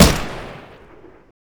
bar shoot, weapons

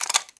clip in, weapons

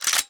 ks23 pump forward; weapons

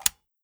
96fs click; weapons

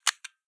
m24 insert, weapons

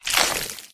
human form short move; flood

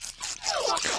infector idle; flood

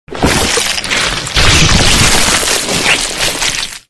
human flood morph arrival

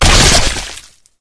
bodyfall combat form; flood

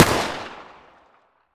mp5 distance fire

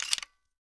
m3s90 load

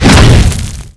flame, dragon

